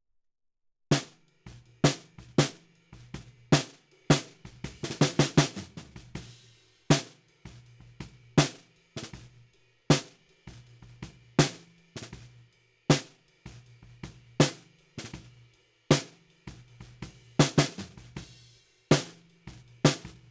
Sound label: Snare Bottom, 160 BPM